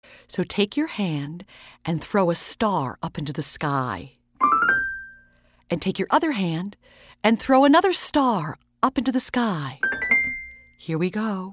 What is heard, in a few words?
Twinkle